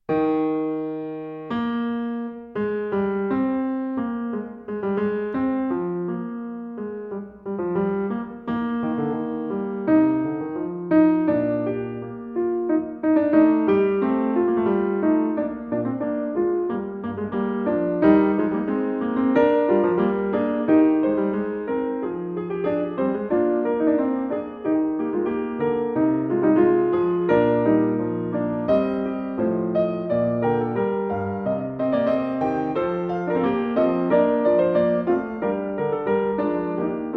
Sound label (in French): fugue en mi bemol majeur; johann sebastian bach; bwv 876